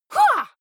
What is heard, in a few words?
female fight shout attack short; vocals; comedy-cartoon; soundpool